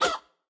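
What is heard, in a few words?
Chicken base attack, 经典及以上形态攻击音效; 母鸡会用自己的喙不断地啄对手; 绿野世界; 卡通农场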